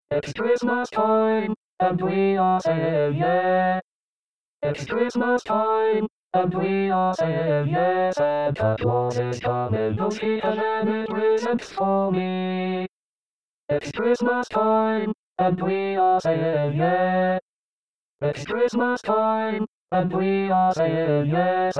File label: it's christmas time satb chanté